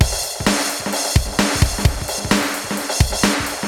Space Echo HR-16B 130BPM

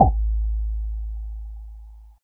MOD SNARE; East West; Phat & Phunky

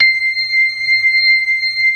ORGAN LOWER MANUAL; Keith Emerson Signature Series

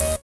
SYN DANCE; Sound & Vision - Gigapack II

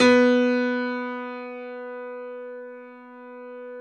B2 YAMAHA, GRAND; NS GRAND

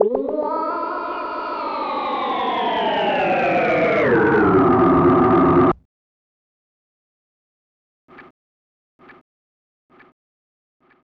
Rhodes FX; EZRollers

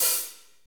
hi-hat, Slow Shuffle; Northstar; Drumscapes Roland

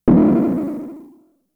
FX, Drum Machines; Fricke Schlagzwerg

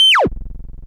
Perc Synth Raw

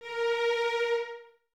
Violins Detache A#4; Miroslav Vitous; String Ensembles

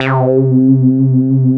SYN42 C2; CMP - Analogon

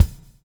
BUBBLE; Spectrasonics - Backbeat

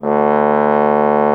trombone; Miroslav Vitous; Solo Instruments VOL-1

